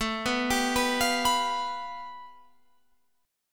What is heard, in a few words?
A 7th Suspended 2nd Sharp 5th, Guitar, Open F; A7sus2#5 arpeggiated; JGuitar